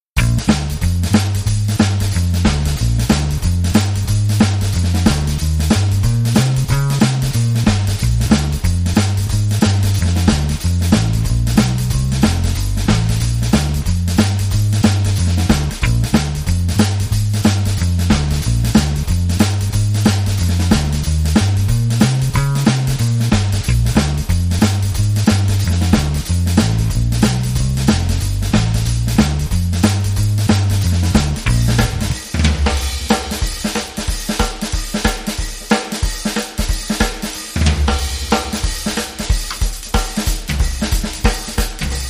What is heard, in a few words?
Blues, DnB